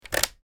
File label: Notebook Computer, laptop